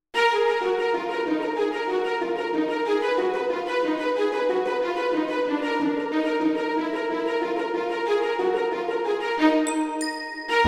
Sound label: Classical Sketch